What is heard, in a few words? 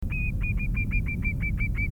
numenius phaeopus, birds calls; scolopacidae